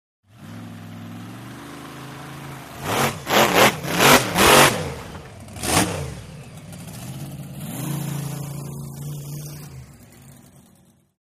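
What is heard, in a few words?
Monster Trucks Revving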